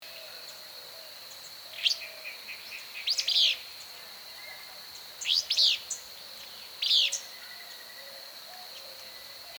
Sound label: Willow Flycatcher Empidonax traillii